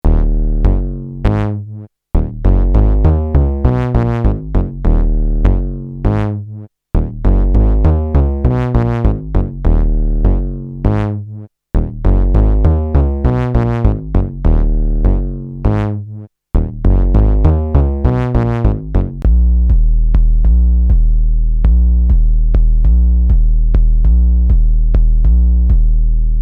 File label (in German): Bass Synthsounds teilweise mit externem Input